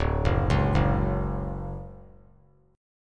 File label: D Major 7th, chord; arpeggiated; JGuitar